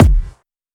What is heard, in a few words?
Kick, Drum Hits; Zero-G Trance Inducer; 90 s Sample CDs